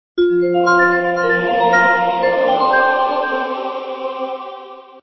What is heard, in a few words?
sms; Celkon; A88